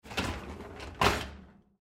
Dishwasher Slide, Dishwasher Slide Out Bottom Rack; Whirlpool Appliance; Household; Appliances; Blastwave